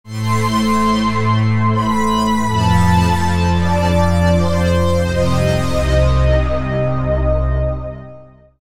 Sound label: PWM Pad